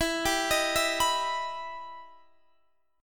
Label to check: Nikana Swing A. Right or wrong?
Wrong